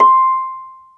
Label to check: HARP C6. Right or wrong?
Right